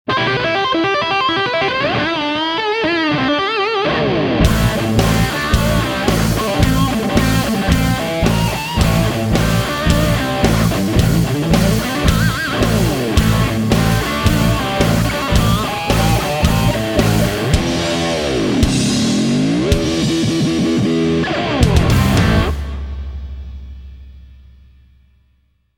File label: Brown Sound Eminence Legend